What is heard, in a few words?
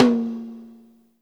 Tom, Live Kit; Sonic Foundry (Sony Creative Software); Ugly Remnants VOL-2; Single Shots; Beatstuff